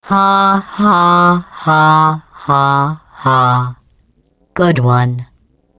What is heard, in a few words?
taunt laugh; GLaDOS